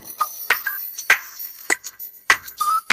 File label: funny